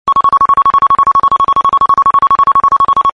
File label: beep rapid continuous